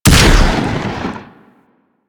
Double Barrel Shotgun Fire; Weapons

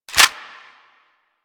Clip In, Weapons; Latron

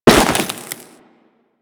Crewman Step Ice, Footsteps; Warframe; Corpus

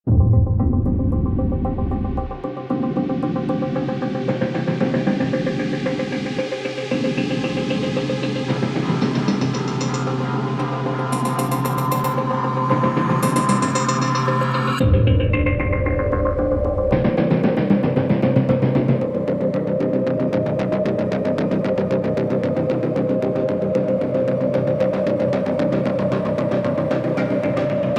Cinematics Music; Warframe; New War

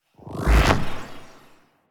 Mag Pull; Warframe

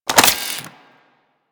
Split Laser Reload Pull Cartridge; Warframe; Corpus

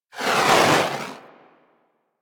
Heavy Grenade Launcher Projectile By, Weapons; Warframe; Archwing

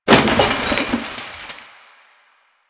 Garbage Bag Destroy

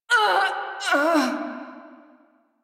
Death, Female; Railjack Crew; New Loka

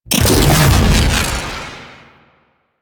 Omicrus Proj Perfect Shot Layer; Warframe; Quests; The New War; Weapons

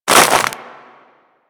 Hammer Pull; Warframe; Grineer Hammer Boss; Foley

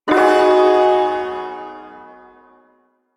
Shawzin Yo Chord E; Warframe; Duviri Ersatz Shawzin

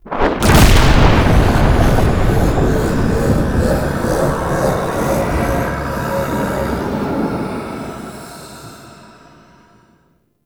Murmur Exterior Thunder Clap E; Warframe; Ambience; Duviri

